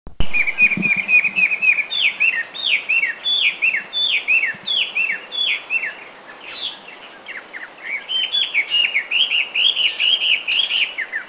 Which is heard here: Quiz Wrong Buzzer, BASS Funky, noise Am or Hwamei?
Hwamei